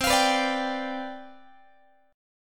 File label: C Major 7th Suspended 2nd Suspended 4th, C, down, Guitar; strummed; CM7sus2sus4